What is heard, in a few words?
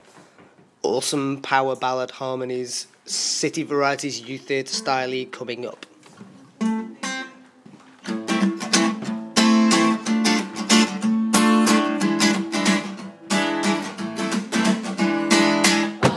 power harmony; city varieties